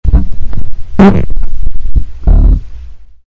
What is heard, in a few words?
fart